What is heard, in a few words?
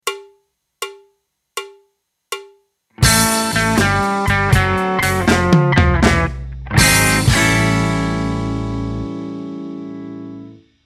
blues